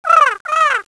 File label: bird